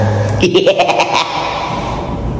fast awkward laugh

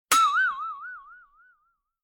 circus boing

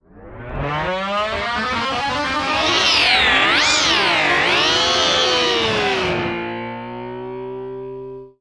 guitar

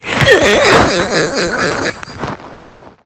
laugh

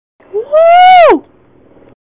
wooooooo

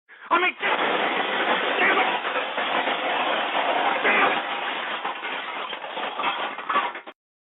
cans; dot wave

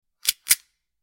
reloading weapon

